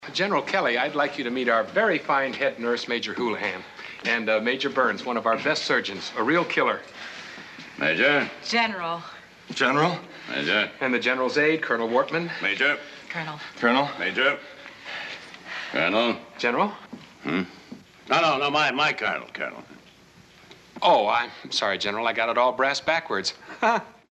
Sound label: brass backwards